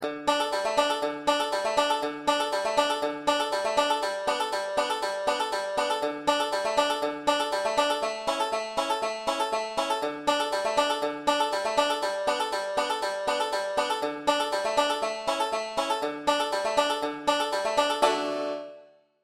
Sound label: acc sans melody, banjo; 5 string; wreck of old 97